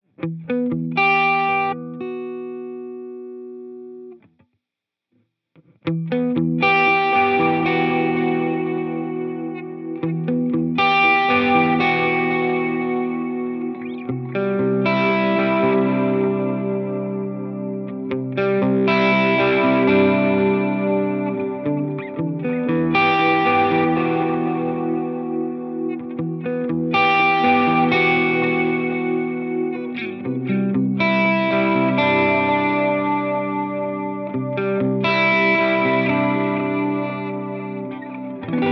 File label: Tape Echoplex